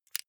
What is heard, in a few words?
lighter electric release